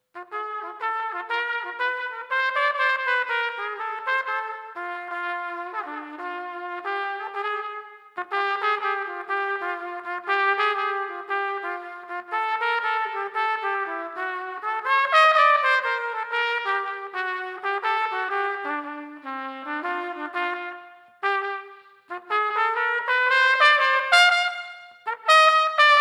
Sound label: Trumpet Solo; Childs Intro Jazz